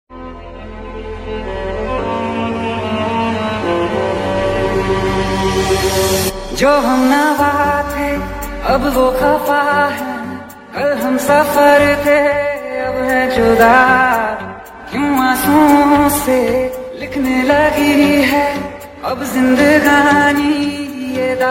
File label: Bollywood remix Song